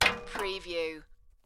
Rock Drop On Metal And Settle

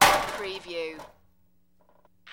Metal Debris Impact Short Medium

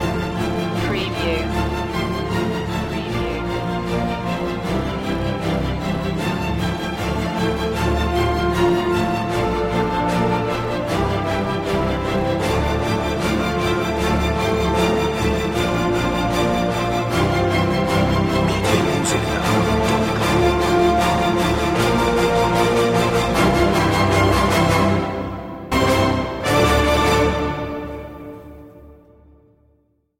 no choir no perc

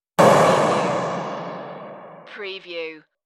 Smash Hit

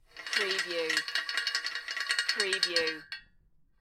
Metal Chain Pulley - Rattling Chains

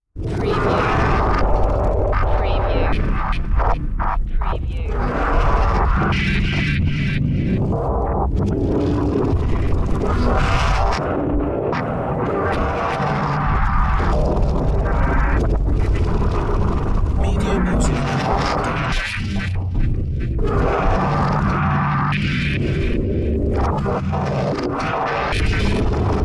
hell ambience

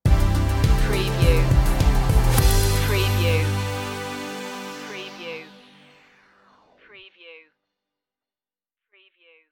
deep dreaming stinger

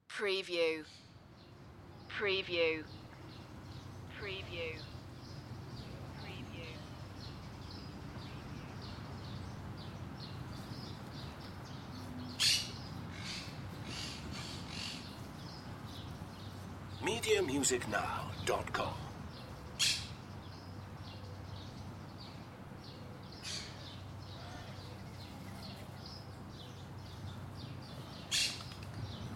Garden of Exotic Birds

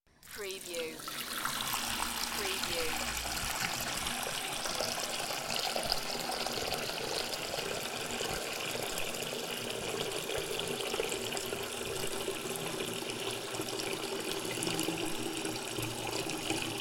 Filling a sink; beanstalk audio

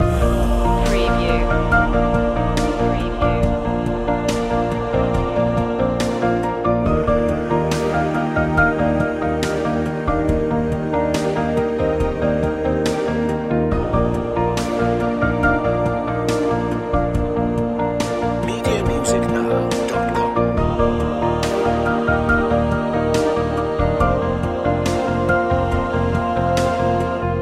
touch the sky loop

